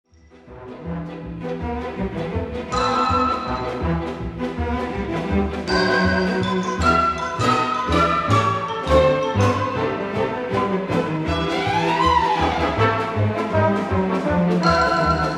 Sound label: Gallop